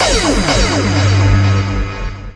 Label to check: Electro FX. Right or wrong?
Right